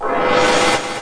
minimize; diablo

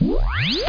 Reverse; Cards for Kids

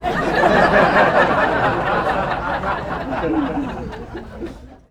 Crowd Laughing; 1001 Sound Effects; Vocals & Wallas

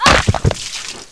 big impact

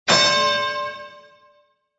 BOINGG

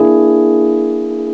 piano keys; instruments various

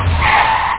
skid; wacky

